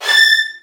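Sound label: violin, A4, left; Virtuoso Strings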